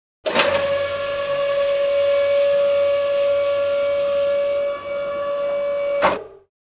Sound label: press machine